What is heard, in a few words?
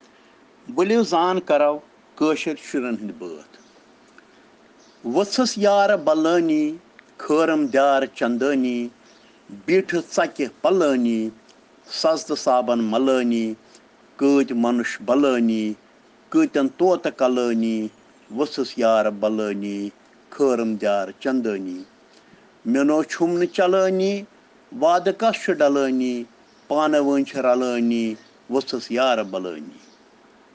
Children Rhyms in Kashmiri